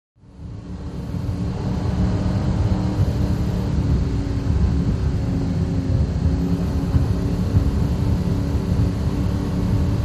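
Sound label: Power Boat Interior Cruise, off shore power boat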